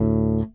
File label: Fifth One Shot DA, Bass; Bass X